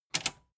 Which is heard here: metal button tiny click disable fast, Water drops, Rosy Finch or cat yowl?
metal button tiny click disable fast